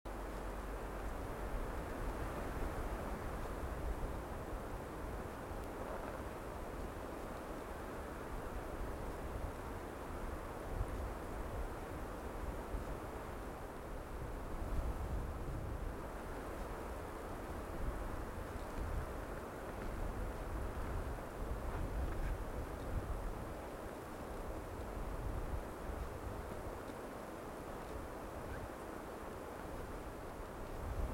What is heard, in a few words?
Cold Wind Winter Ambience Loop; UltimateFishing2020